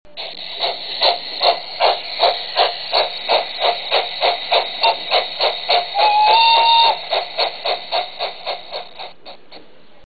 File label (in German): maerklin flaschenoeffner sound